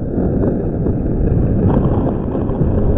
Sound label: grass; stunt rally; surface